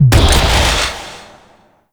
Charged Shot; Weapons; Warframe; Tenno; Wraith Sidearm; Epitaph Prime

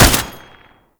Lasrian Tank Pintel Turret Shot; Weapons; Warframe

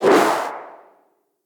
Exit Possession; Gadgets

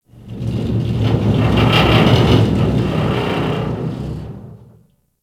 Ship Rattle; Warframe; Corpus Ship Remaster

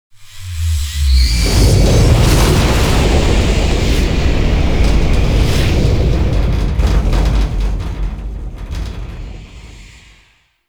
solar flare; Warframe; The New War

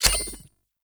Reload Clip Eject; Gotva Prime; Weapons

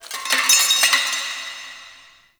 Metal Debris, Ambience; Warframe; The New War; Zariman

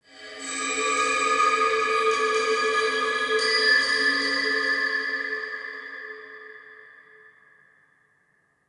Root Cave Magical Chimes; Neathuns

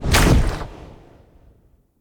Staff Caster Cast Short Shot; Weapons; Player; Staves; Wazzard Staff Caster

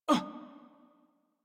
Generic Short Pain Operator Voice; Warframe; Operator Mission

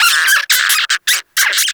Forest Rodent Player Lure Call; Warframe; PoE; Animal Lure

